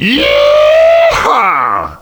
yeehaw

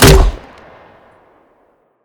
shoot, m79

